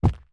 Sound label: step; chat grid